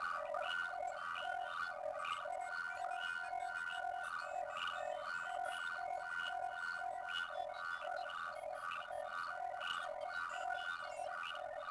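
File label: telemetry loop; Star Wars Obi-Wan; XBOX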